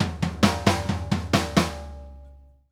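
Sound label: ROCK FILL, drums; Sampleheads - New York City Drumworks VOL-1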